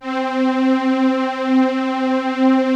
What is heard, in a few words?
Prophet 5 C5; Hollywood Edge - Giorgio Moroder Rare Synthesizer Collection